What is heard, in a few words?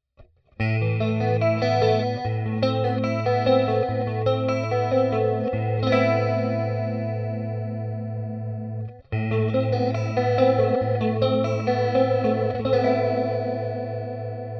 Chorus